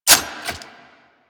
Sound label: Reload, Weapons; Warframe; Zylok Prime